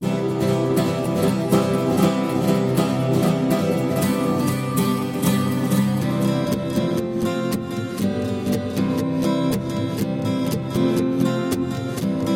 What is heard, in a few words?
Reverse reverb